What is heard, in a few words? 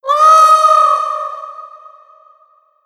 Forest Amb